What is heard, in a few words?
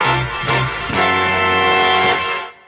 horn to the future, stabs